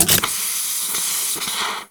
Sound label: pressure build; weapons; tf2; dragons fury